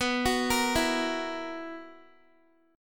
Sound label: B Major 7th Suspended 4th B down Guitar; arpeggiated